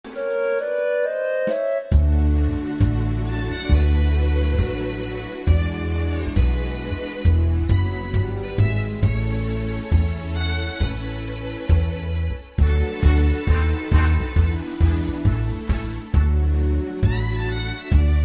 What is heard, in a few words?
How the Other Half Lives Instrumental